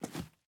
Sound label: Step Marble; MiSide